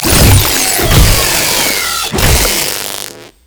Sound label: Tentacle Swing Flesh; Warframe; Proto Infested; Ancient; Abilities